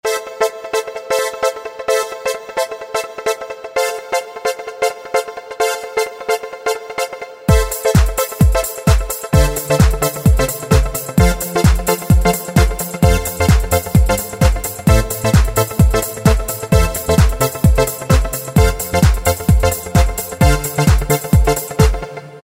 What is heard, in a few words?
Trance, Yamaha YPT-400; Voices